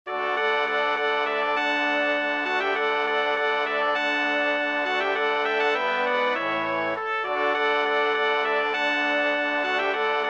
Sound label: Brass Quintet